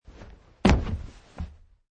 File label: Landing; Man wearing jeans and hiking shoes jumping and landing on carpet surface; Wrestling And Struggling Sound Effects